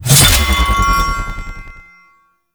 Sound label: plunge ability cast; Enemies; Abilities; Warframe; Duviri; Dax Knight